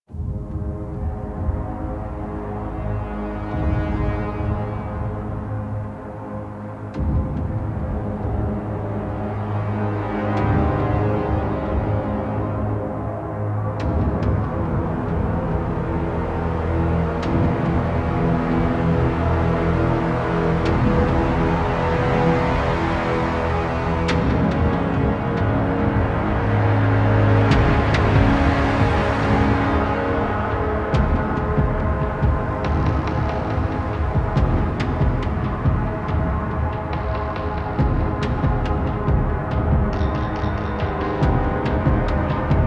Ambient, Music; Warframe; New War TennoCon; New War Kahl Mission

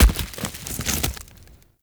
Tree Dead Large Destroy Chunk; Objects; Destructible Trees

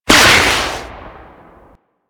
Guard Jumping Smash Activate; Royal Guard; Abilities

